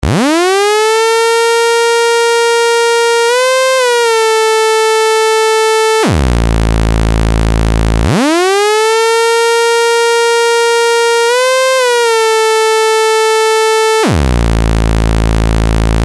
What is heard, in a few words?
raw saw; tellun